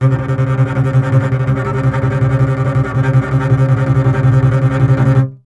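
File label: string contrabass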